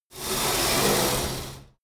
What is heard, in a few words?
pullup machine cable release fast, fitnessstudio